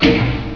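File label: switch on; Doom and Doom 2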